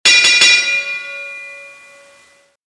end gong

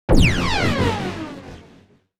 beam beam

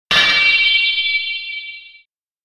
sword

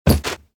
dosa oto

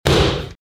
dageki md

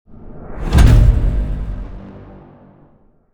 bukimi

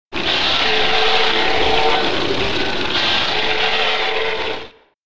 fire

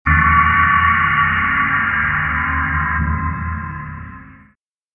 bukimi

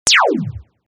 gun pyunn

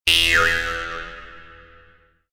boyoyonn

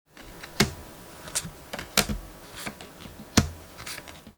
card

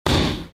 dageki lt

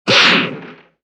damage

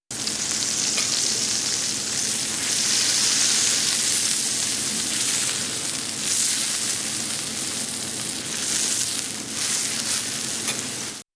fly pan

